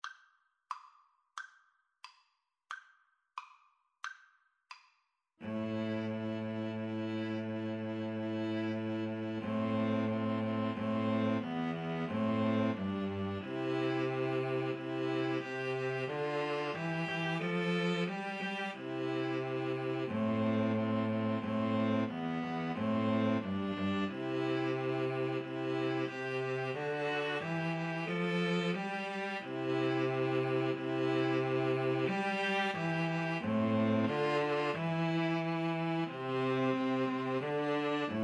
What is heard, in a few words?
capriol pavane, String trio